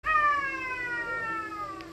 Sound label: ahh; Shoresy